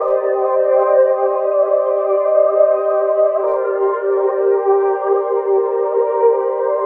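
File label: Confusynth, синтезатора техно транс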